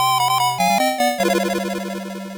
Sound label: Death; Aladdin; Master System